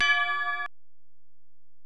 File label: Bell, Alesis DM-PRO